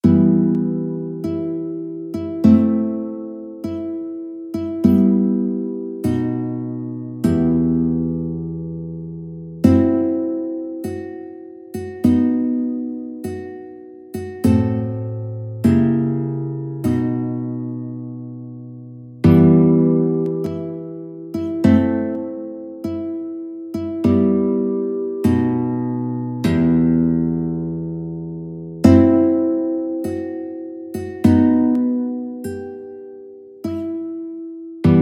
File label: guitar